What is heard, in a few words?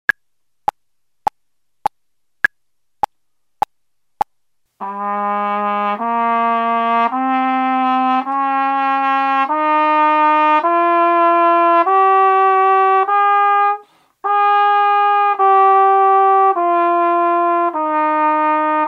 Scale of Bb @102